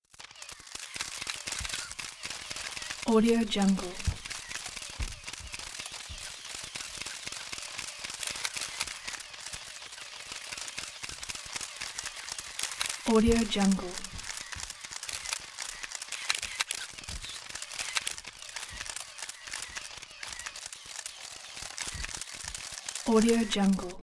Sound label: camera frenzy repetitive obsessive; prosoundfx